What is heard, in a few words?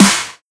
Snare